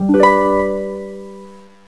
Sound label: heal